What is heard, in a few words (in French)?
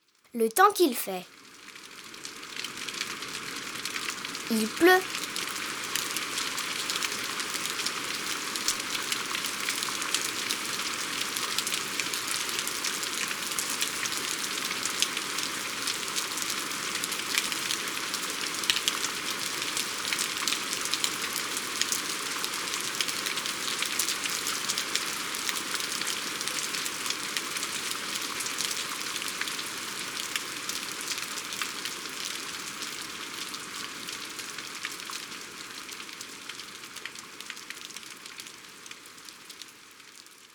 La pluie; Mon imagier sonore de la nature